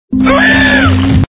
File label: scream; courage